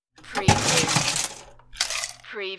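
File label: Ice Dig In Glass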